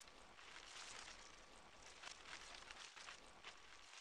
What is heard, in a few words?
dustcloud